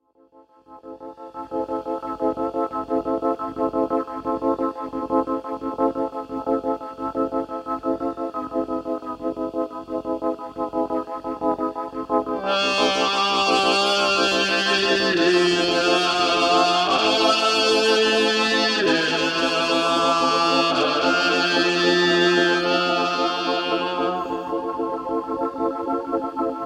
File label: duet for vocaloid trumbots